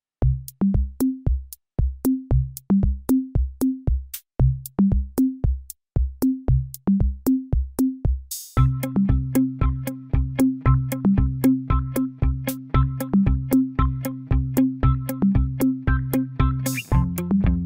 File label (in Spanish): goat musica para ninos